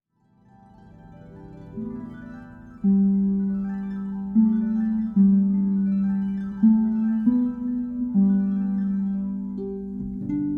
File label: solo